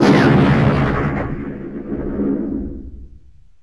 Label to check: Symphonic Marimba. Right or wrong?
Wrong